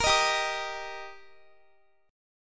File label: G Minor Sharp 5th G Guitar, chord; Gm#5 strummed